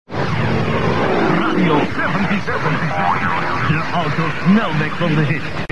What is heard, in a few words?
jingle; 77 Sint-Niklaas